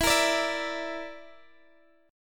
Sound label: A sharp Major Flat 5th, E